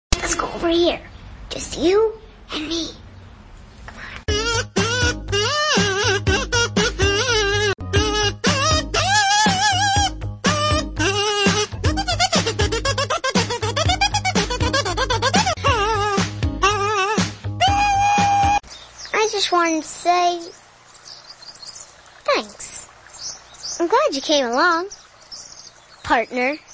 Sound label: kazoo